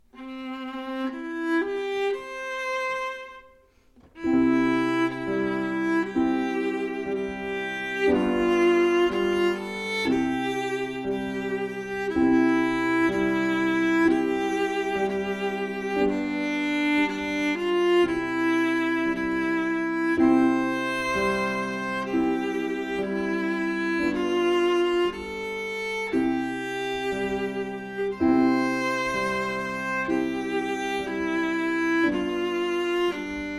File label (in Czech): doprovod klavir; koledy